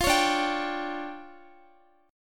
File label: D Minor Major 11th, down, Guitar; DmM11 strummed; JGuitar